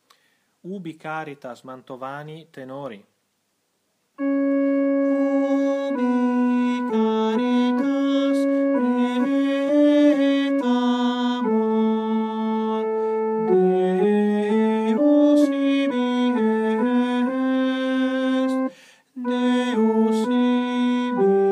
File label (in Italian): TENORI